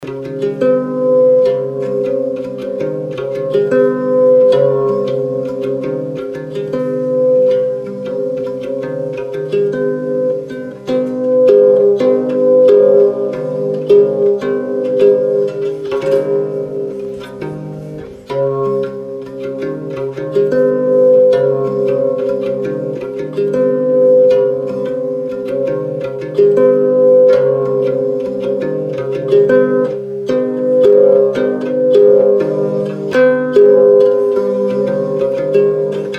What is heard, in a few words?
psaltry